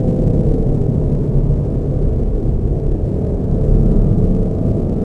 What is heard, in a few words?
tunnel, ambience; artus; Star Wars Jedi Knight II Jedi Outcast; XBOX